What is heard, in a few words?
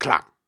Grunts and Chants, Viking Vocal